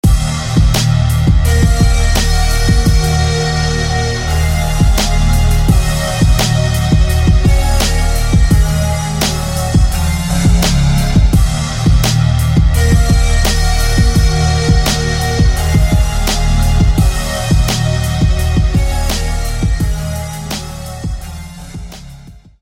Evocution Synth

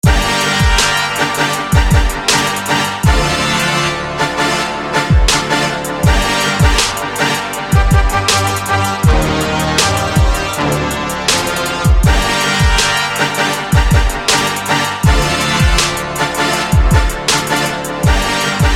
Flava Brass; SFB FX

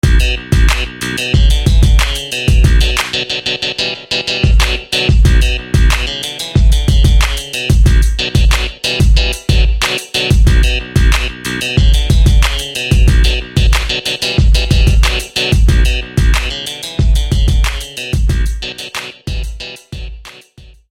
Guitar; SFB FX

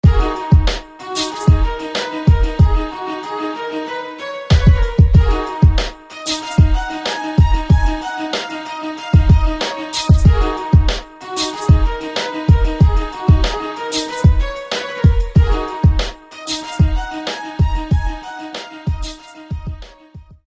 Marcato Tap; SFB FX

